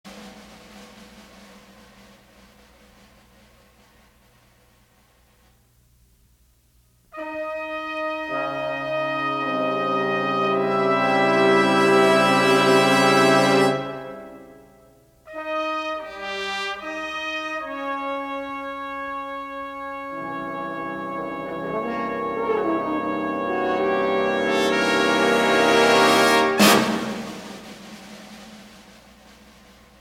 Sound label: Jazz Suite for Brass; Jazz Magazine